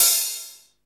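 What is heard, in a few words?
hi-hat, hi-hat real; Sound & Vision - Gigapack I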